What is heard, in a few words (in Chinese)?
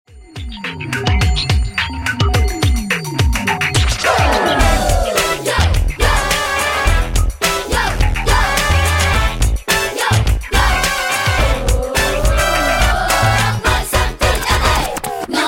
無對白